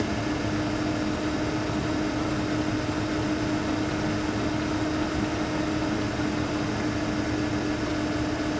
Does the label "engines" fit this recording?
Yes